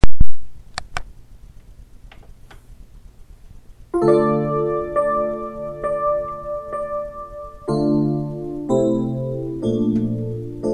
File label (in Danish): akkompagnement